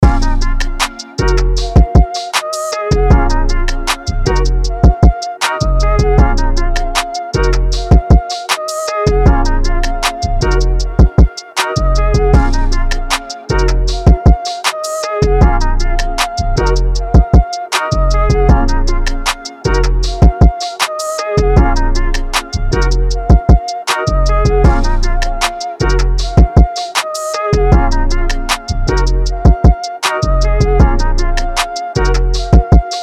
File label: 156 BPM Bbm; UNISON DEMO; Wenduks